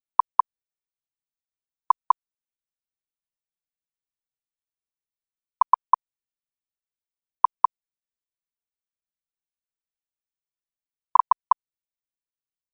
stimulus patterns; empty durations are marked by 10 ms pure tone bursts of 1000 Hz including a rise time of 2 ms and a fall time of 5 ms; stimulus patterns of Figure 6